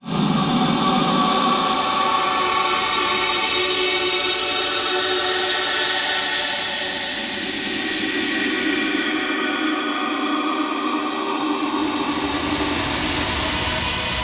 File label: RINGTONE